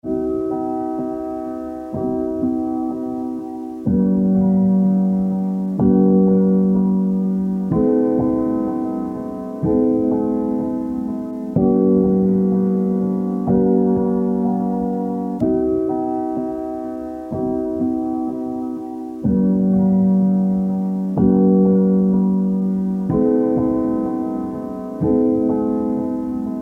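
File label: yoga music calm music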